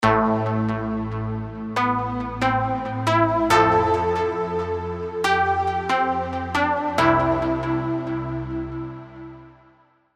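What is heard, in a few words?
Spire trance pluck; Oscillate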